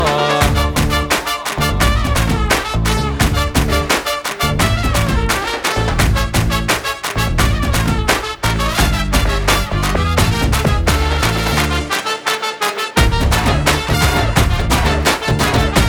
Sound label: Instrumental